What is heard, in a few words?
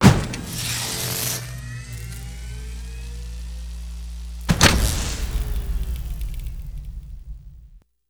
Fusebox